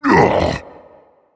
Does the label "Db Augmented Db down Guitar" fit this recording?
No